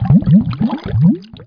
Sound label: bubbles; outlaws